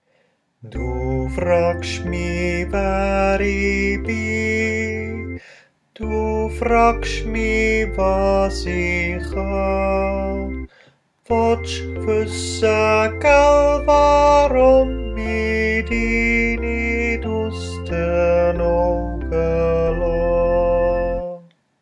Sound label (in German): sopran